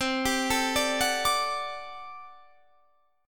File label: G Major 7th Suspended 2nd Suspended 4th C